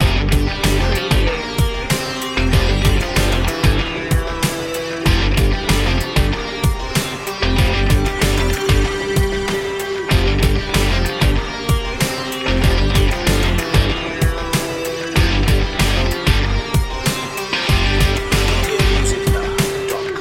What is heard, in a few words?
epic trailer sports loop